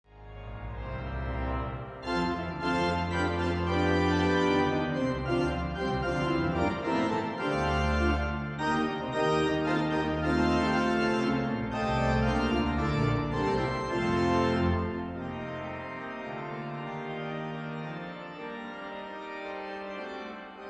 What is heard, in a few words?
Fanfare, The Grand Organ of The Royal Albert Hall; John Cook (1918 - 1984)